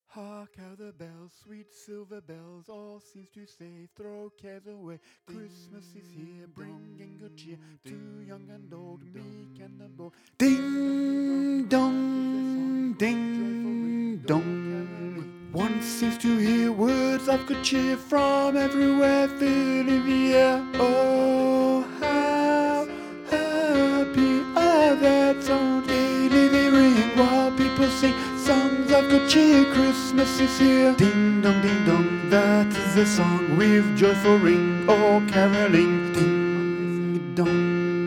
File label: tenor; christmas; Carol of the Bells